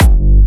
Jump Kicks, Bassdrums

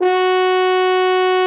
bucket F#4; Xsample VOL-3

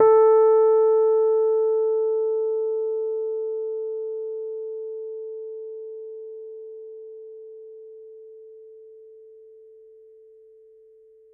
A4 MID, Rhodes Mark II; CEPCo Sample Series Vol. 3

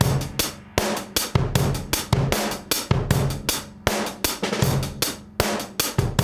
DOWN KIT 78; AMG Gota Yashiki Groove Activator

